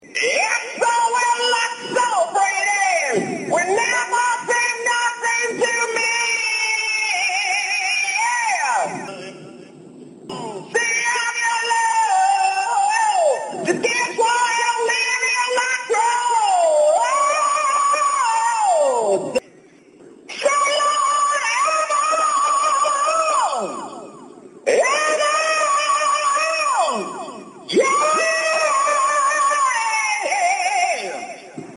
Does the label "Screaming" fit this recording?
Yes